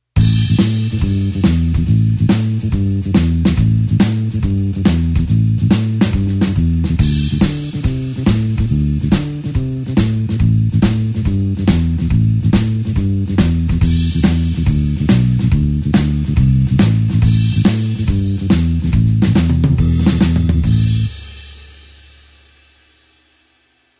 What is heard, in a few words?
blues bassline shuffle A